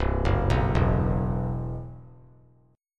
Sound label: C Major 7th Flat 5th C; CM7b5 arpeggiated; JGuitar